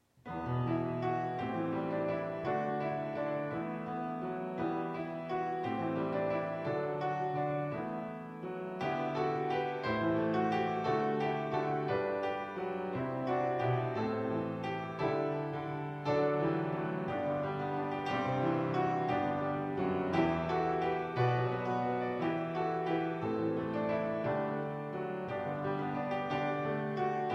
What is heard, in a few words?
piano